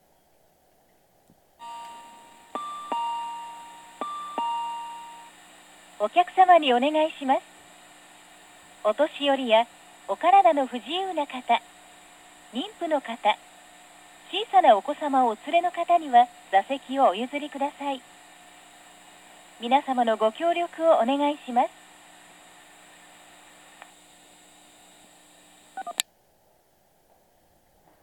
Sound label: kunijima, station; 座席に関しての放送です。